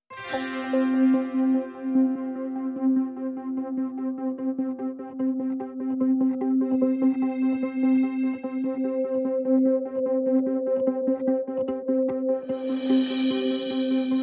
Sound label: No Melody